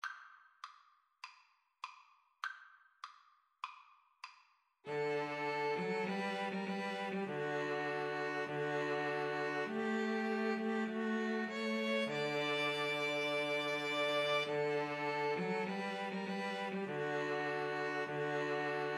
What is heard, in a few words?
2 Violins Cello